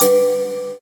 stop bonus; Amatic; Book of Fortune

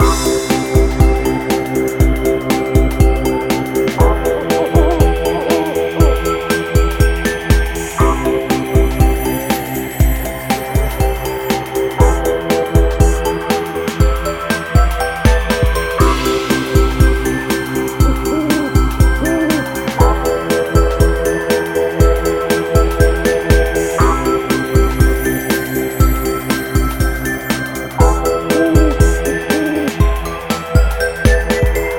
free spin melody; Amatic; Magic Owl

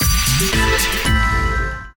win; Amatic; Casanova

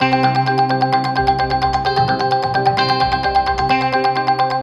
collect; Amatic; Chinese Spider

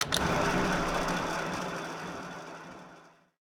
spin, slot; Amatic; Book of Pharao

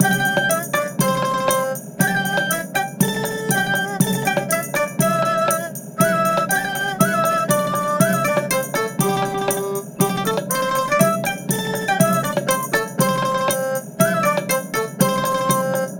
melody; Amatic; Big Panda

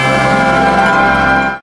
win; Amatic; Book of Fortune

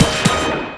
stop bonus; Amatic; Fruit Box

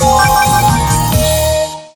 free spin init; Amatic; Book of Aztec

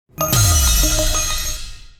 nice win; Amatic; Chinese Spider

